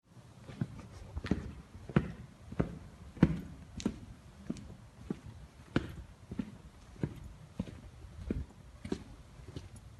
Heavy Footsteps Walking

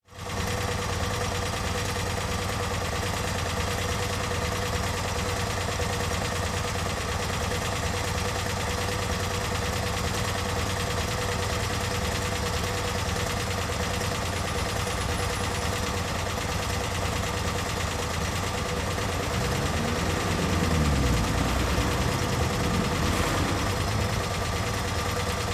Fiat 126 Crossroads

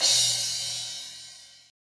Crash

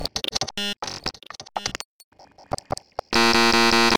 Clicks And Pops

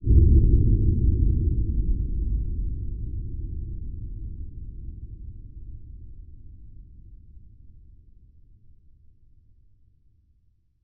heavy steel noise

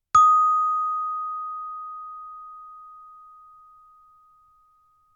Chimebar Eb

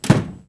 Door

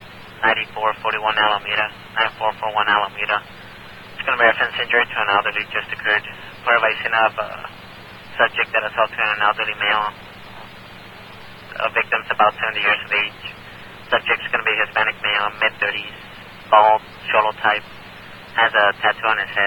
Police Fire Ems Random

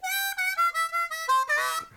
Harp

